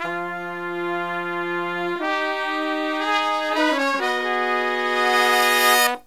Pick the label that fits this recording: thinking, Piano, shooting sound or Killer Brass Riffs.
Killer Brass Riffs